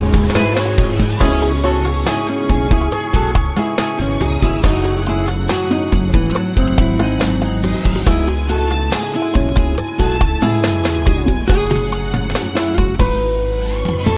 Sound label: slow music, jazz